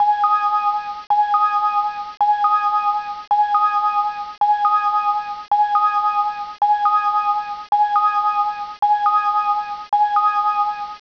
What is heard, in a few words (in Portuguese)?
sint Loop